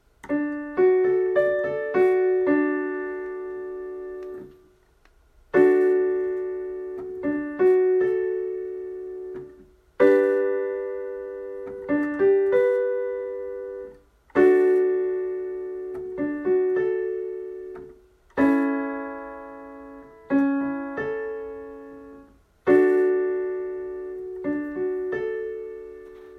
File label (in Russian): Аккорды ре мажор